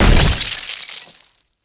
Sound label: ice cube; battle